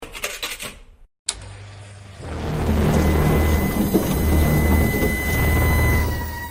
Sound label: explode